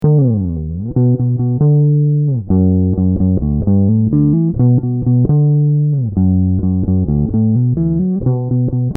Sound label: guitar bass original